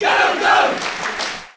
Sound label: Crowd JP Ganondorf